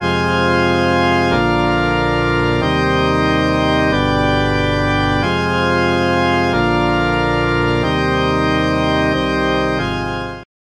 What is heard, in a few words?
Rotary Speaker Thickener Matrix Organ Dry; AmpVibe; Syntheway